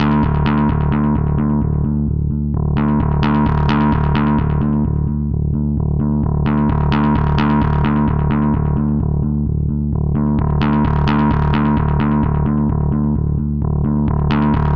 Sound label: 130 d bass loop; methane and octane; hollywood audio design